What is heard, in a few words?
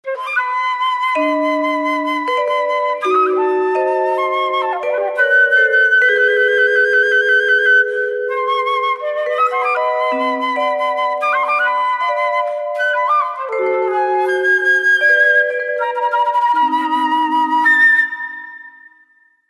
Flute Melody; LG